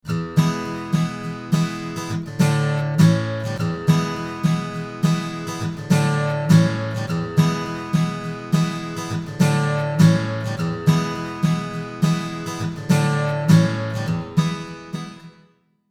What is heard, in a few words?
Guitar Medium Room; Reverb